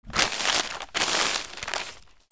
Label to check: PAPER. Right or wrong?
Right